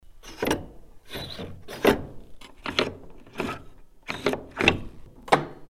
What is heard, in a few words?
door bolt